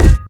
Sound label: physics cube md; Portal 2